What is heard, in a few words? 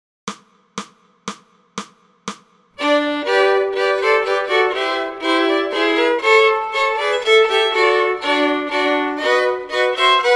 We Wish You A Merry Christmas Fiddle